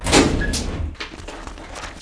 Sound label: filing